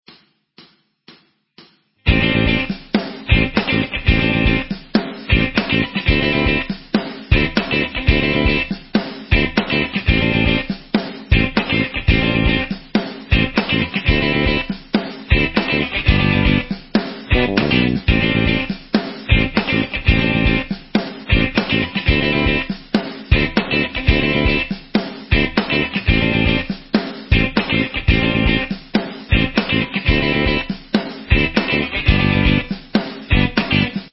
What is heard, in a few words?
guitar solo karaoke